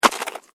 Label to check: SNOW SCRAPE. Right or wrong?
Right